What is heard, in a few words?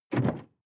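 sports car interior trunk close soft; verkehr transport; autos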